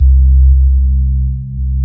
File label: C2, Synth Line